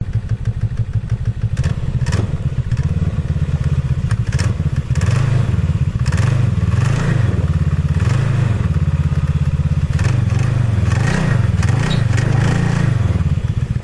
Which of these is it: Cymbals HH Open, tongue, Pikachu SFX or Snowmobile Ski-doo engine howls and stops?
Snowmobile Ski-doo engine howls and stops